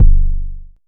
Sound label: analog tonal, Kickbank